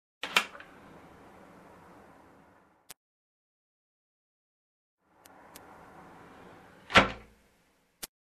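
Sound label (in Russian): звуки открывания закрывания окна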